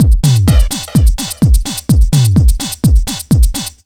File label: 127 BEAT, Fresh Disco House; AKAI; USB Soundscan vol.20 - Fresh Disco House I